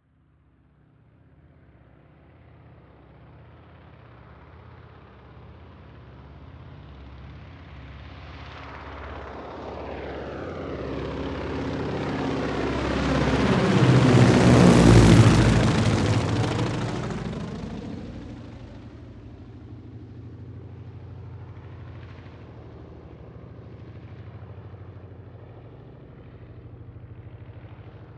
propeller plane ju52 fly by overhead long leaving

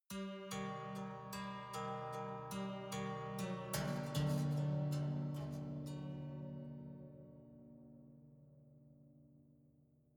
haunted strings sinister

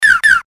creature quack funny

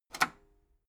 metal lever button radio disable medium

